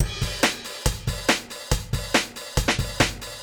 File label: Drum Loops